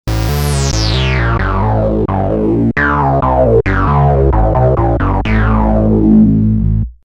solid synth

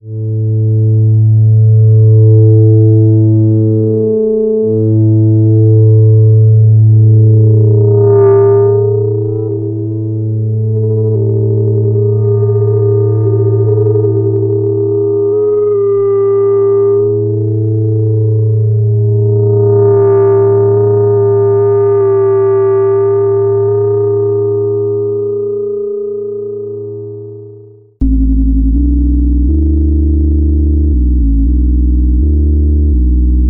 polivox cry

